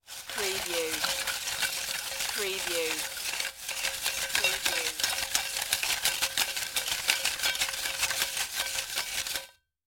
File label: Paper Spinning in Metal Pot (Ticket Cage)